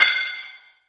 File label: SPARK metal, Roland TD 7; drum machines